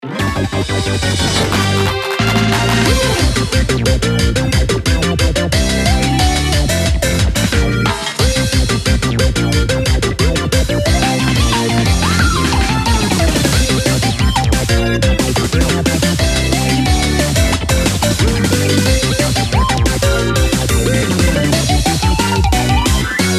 battle

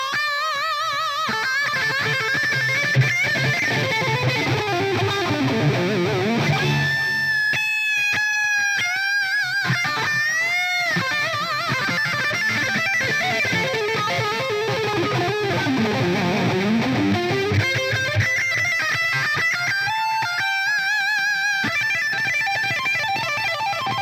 Music guitar; Plants vs. Zombies Battle for Neighborville; GnomeGnight

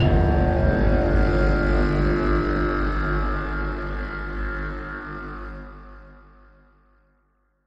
Music Stingers Battle Outro

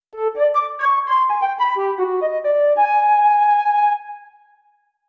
flute; undead overlord linux macos windows gamerip 2014

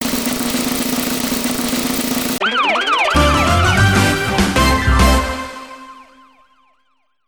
Pushblock Slot Machines

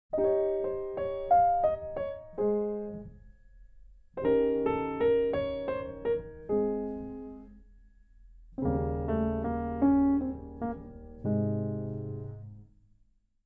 Pathetique Piano Sonata No.8; Takt Op. Destiny

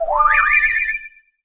SFX - Item - Boo Delivers; Mario Kart 8

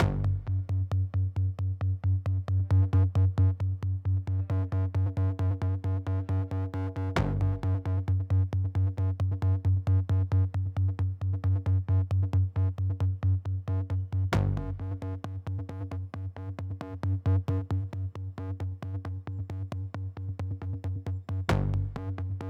Music Tension Pulse Med Tempo; Battlefield 3

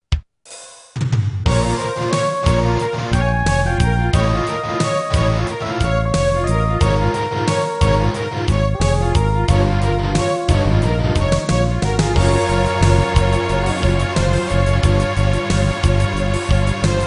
Transition; Dragon Valor

